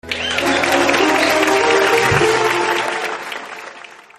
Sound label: fanfare